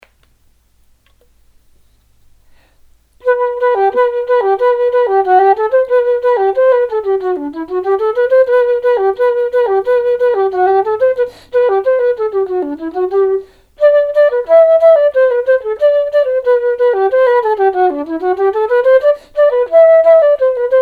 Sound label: fairy dance